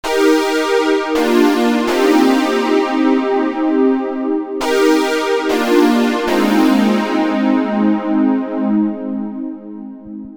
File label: DSS MS autobend